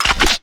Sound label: cluster shot; LoL; graves